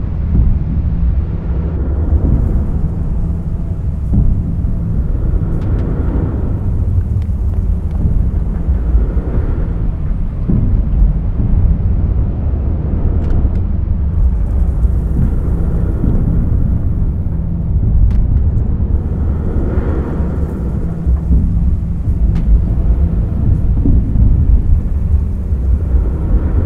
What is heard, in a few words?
Desert Tower Base Loop; Journey